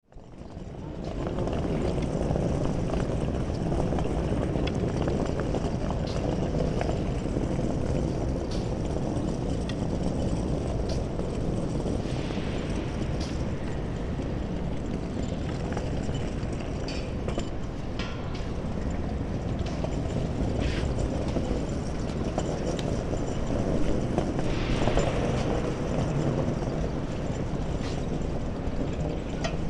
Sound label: Suitcase On Wheels Over Various Surfaces; Rolling; Objects; Tom Hutchings